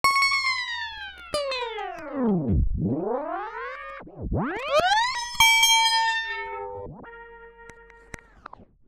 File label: Textures; 99Sounds; The Weird Side Samples